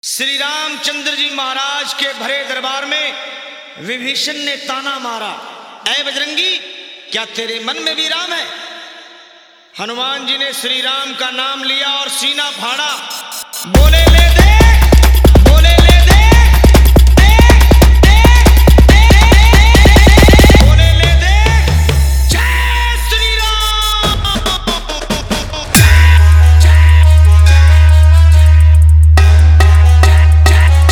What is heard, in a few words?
Bass Beet Navami Dj Remix; Ram Navmi Tahlka